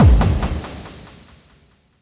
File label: Fall, Hits & Falls; Zero-G Trance Inducer